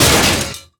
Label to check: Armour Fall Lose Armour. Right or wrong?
Right